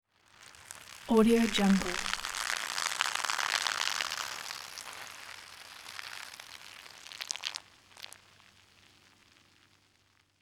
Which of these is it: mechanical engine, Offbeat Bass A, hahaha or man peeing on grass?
man peeing on grass